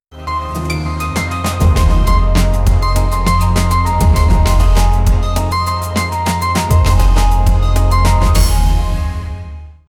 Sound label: the impactful music of an, trailer